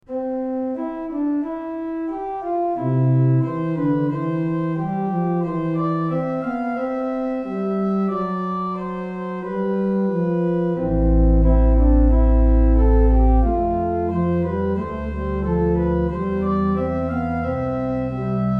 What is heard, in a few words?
Easy Prelude, organ; StAnnesMoseley